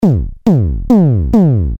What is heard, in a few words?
Loop 138bpm, Drums; leloops